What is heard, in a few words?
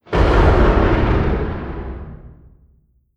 distance xl arc emitter fire; weapons; Stellaris